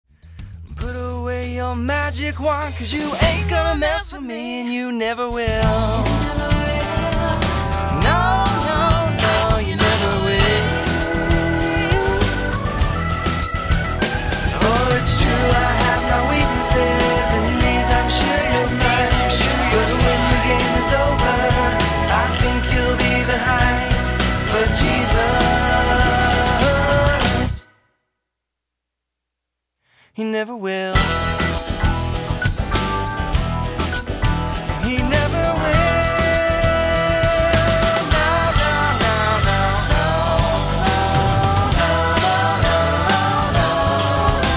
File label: Choir Of Two